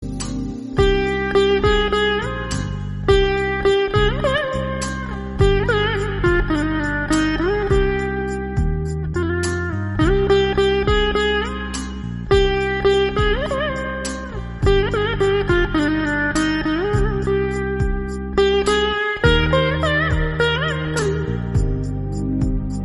veena instrumental; alaipayuthey

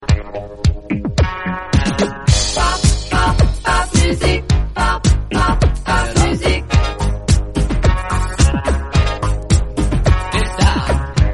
pop

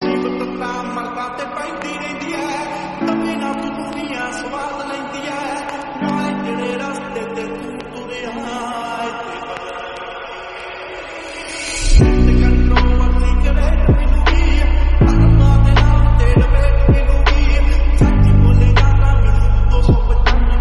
lofi remix

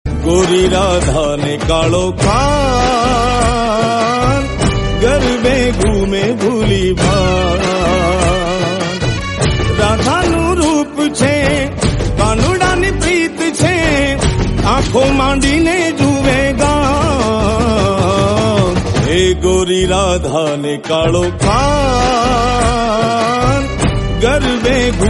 devotional hindi song

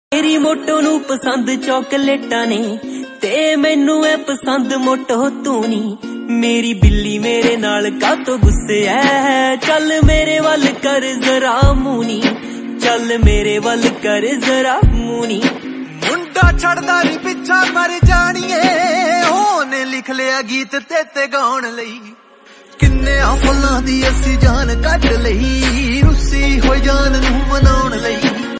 punjabi

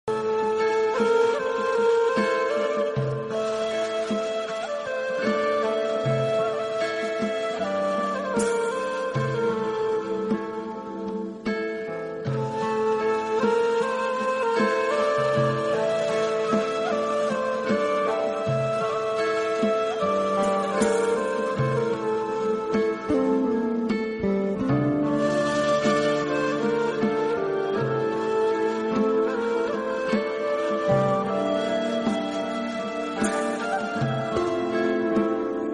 mood off instrumental

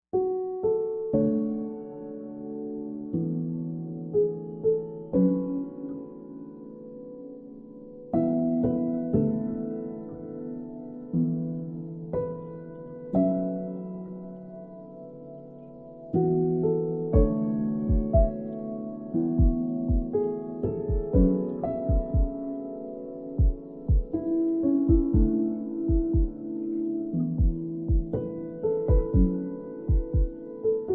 instrumental song; attack on titan

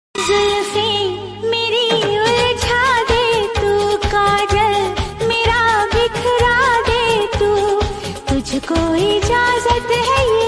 love song

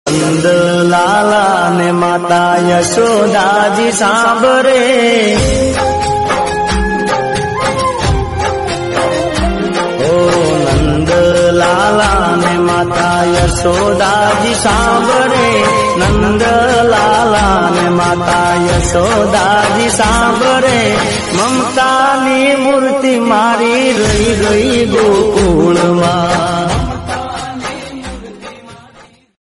devotional hindi

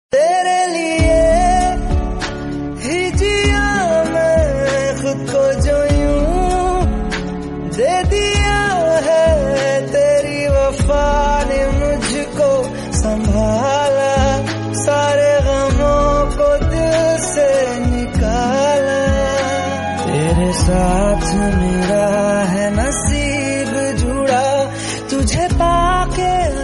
hindi song; ashiqui 2

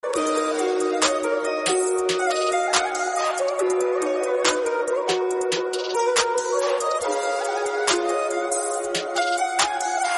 agri koli beats

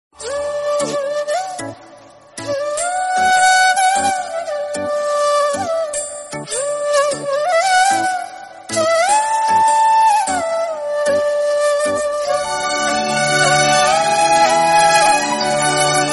malayalam instrumental

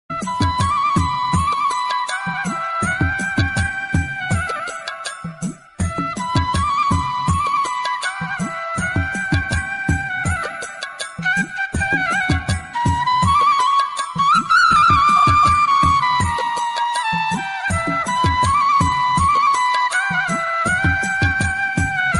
flute instrumental bansuri; hero; bansuri ka awaaz

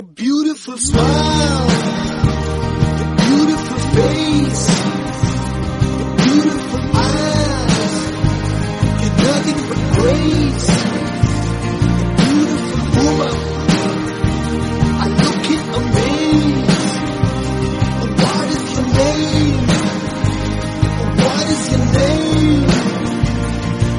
tamil bgm

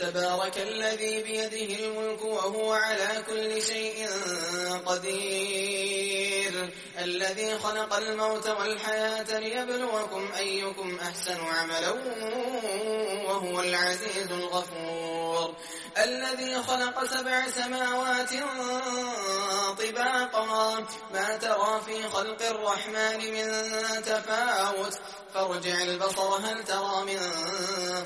islamic song

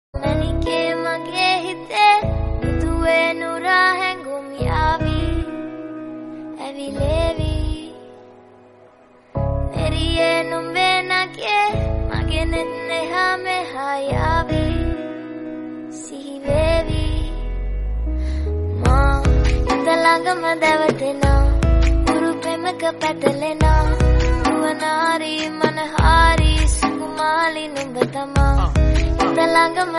female voice bengali song

